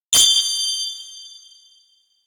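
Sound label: electronic confident kiraan; anemoi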